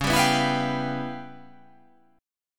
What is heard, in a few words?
C sharp 7th Sharp 9th Flat 5th, C sharp, down